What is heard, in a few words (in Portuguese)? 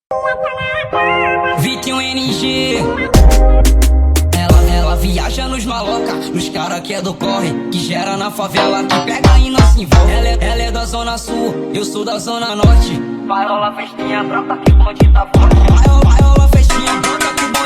musica